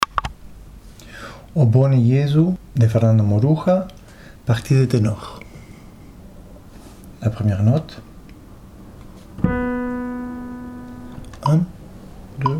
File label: O bone Jesu tenor